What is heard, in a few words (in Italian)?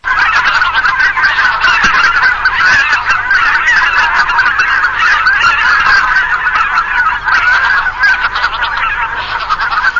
OCA lombardella grisa, birdsound